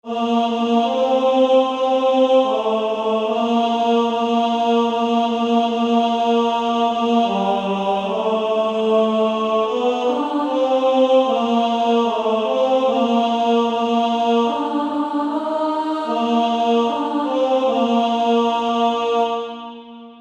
Female Choir; Jesus Calls Us; 2nd Alto

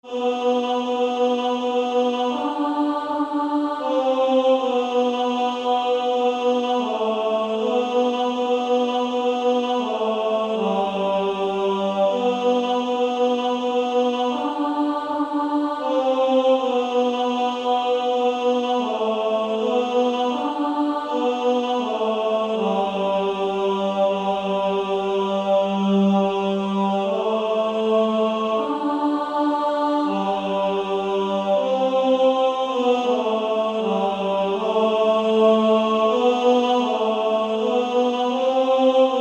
Female Choir A2; Angels We have Heard on High

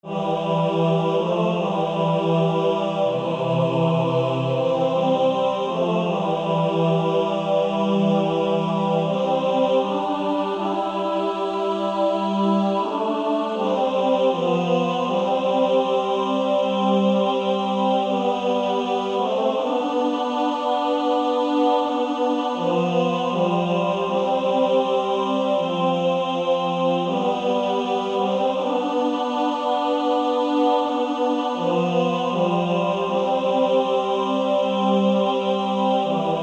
Male Choir TB

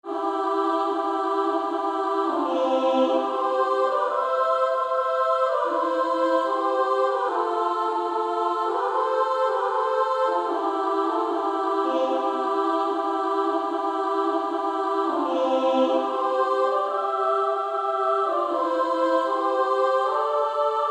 Female Choir SA

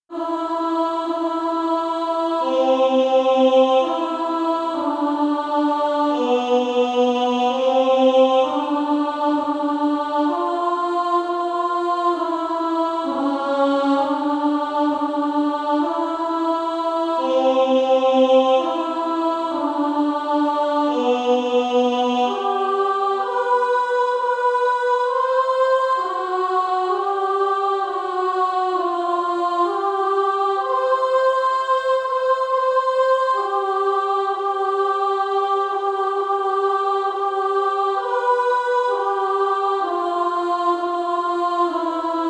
Female Choir; Alto

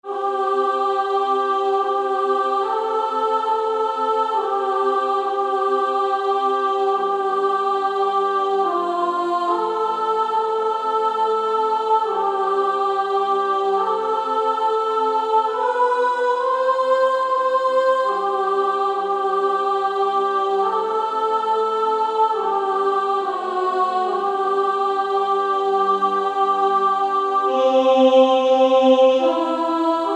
Female Choir S1; Low In The Grave He Lay

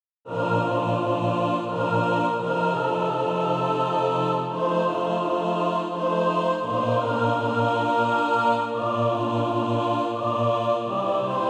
mixed

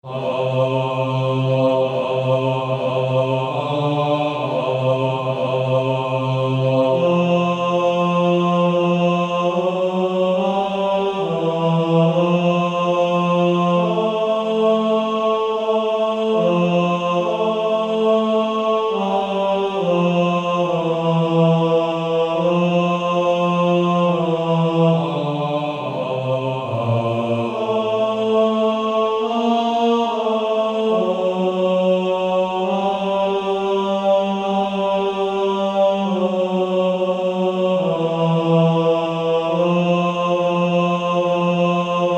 Male Choir

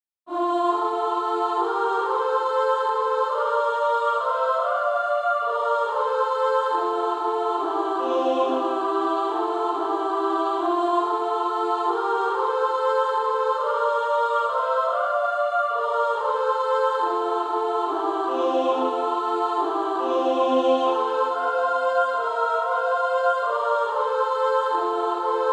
Female Choir

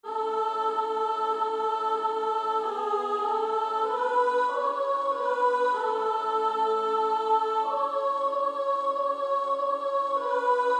Female Choir; 1st Soprano

